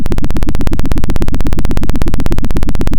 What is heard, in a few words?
Sound of a helicopter